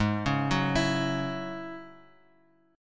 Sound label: Ab 7th Suspended 4th Sharp 5th down Bass Standard; Ab7sus4#5 arpeggiated